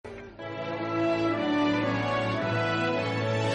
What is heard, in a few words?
news theme; disney tv animation